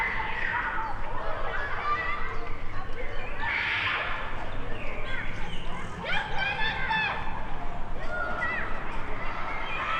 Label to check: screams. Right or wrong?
Right